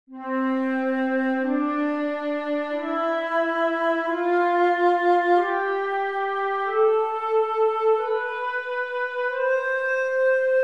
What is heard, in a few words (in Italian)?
do maggiore, scale